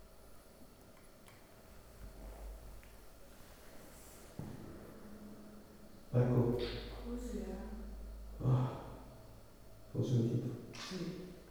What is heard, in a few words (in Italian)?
in sottofondo un probabile lamento; sviluppato nella zona del salone delle feste